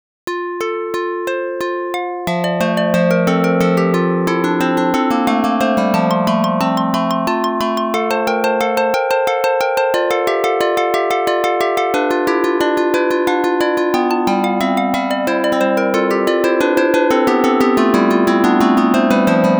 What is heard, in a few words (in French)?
sitar; bach